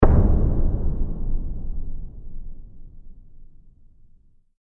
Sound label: Drum Hit Trailer Musical Forbidding Single Hit Trailer Accent; Forbidding Drum Hit Musical Single Impact Trailer Accent Castle Door Knock Haunted Eerie; Trailers; Sound Design